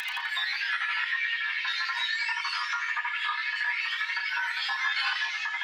Tonal Re Draw, sparse soundscape

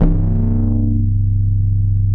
BASS L, Drum & Bass 160 BPM; Ueberschall; Drum & Bass Flash Trackz

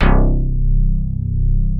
FM BS, SYNTH BASS; OBDX; East Collexion; Bass S3000

